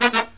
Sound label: LOW HIT, Brass